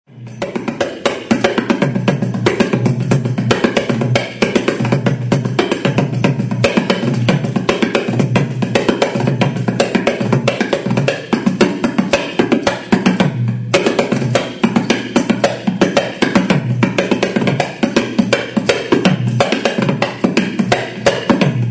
kanjira short; Kanjira lessons; Pete Lockett

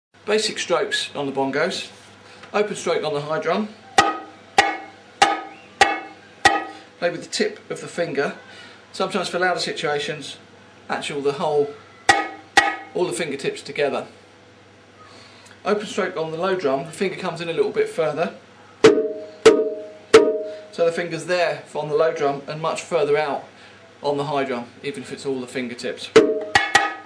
bongo STROKES

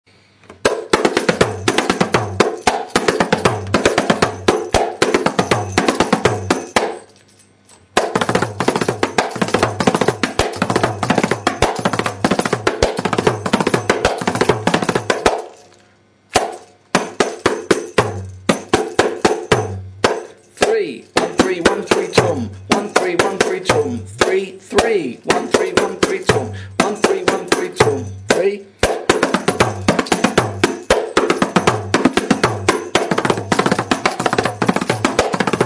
kanjira sixteenths fill

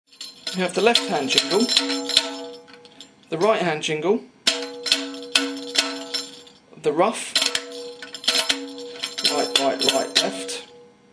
strokes n jingle

